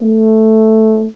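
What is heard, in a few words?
EUPHONIUM; BRASS; M8; CMI Factory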